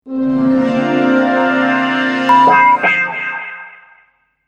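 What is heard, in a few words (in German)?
Sound Logo mit Sound Bett 2 Töne Sweep; Soundlogos für Ihr Corporate Design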